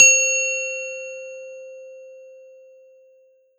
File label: Roland SC-88 Glockenspiel C5